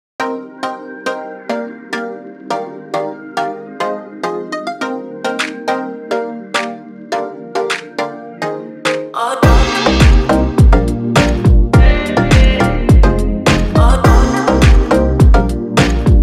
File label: instrumental